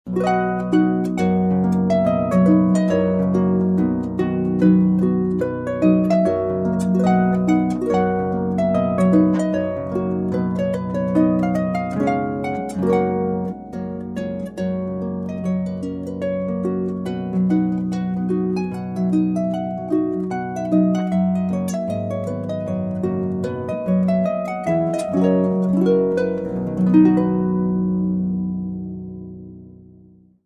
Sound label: trumpet tune